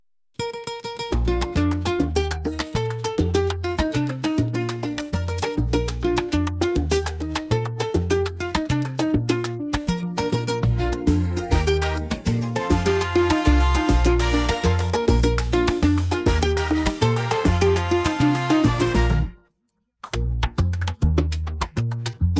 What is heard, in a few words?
Instrumental, Podkład muzyczny; Czikùtka; autor:inteligencja Sztuczna; piosenki dla dzieci